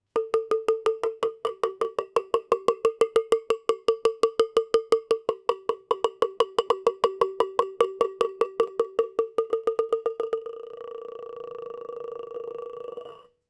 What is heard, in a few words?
the boll bouncing on plat